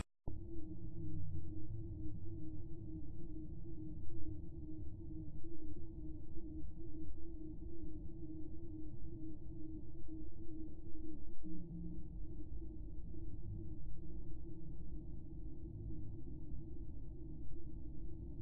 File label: woodpeckers